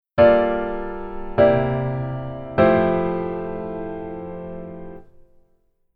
Keyboard Voicing Hi

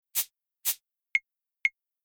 Rhythm RnB Sample Perc

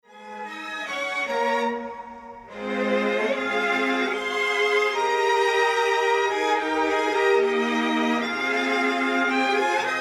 Strings Block Sample Strings Only

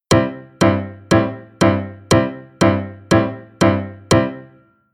Rhythm

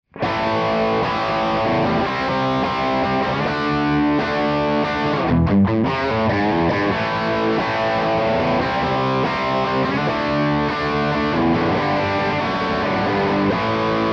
guitar LP

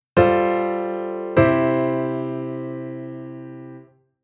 Chord IV on V-I

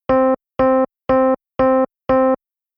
Keyboard EP Noise

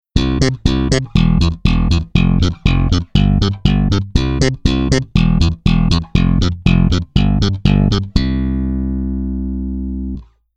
Bass Playstyle Slap

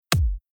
Future Bass FX Hit

